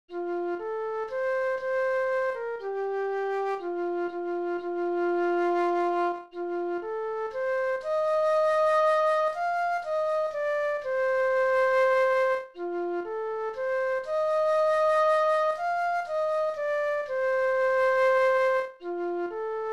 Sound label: FLUTE ONLY; Folk Sounds Sain y Werin; Lisa Lan; fair Lisa